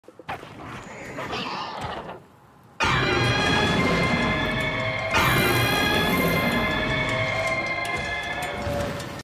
legiana cry; legiana scream cry mhw

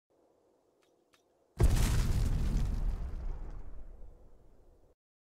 grenade, black squad grenade explosion

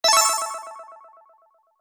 positive game achievement

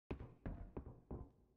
metallic; footsteps; black squad

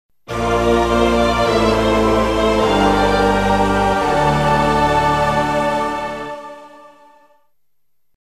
music item acquisition fanfare; metroid prime

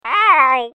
aaaaai; banjo kazooie kazooie

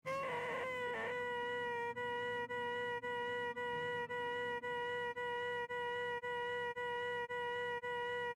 weird wooeeeeee noise

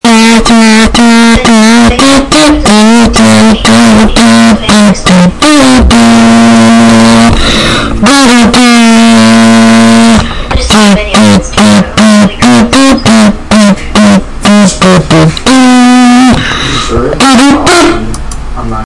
kazoo

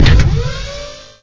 robot step sounds; short

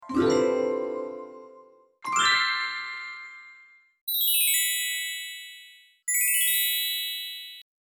shine magic sound

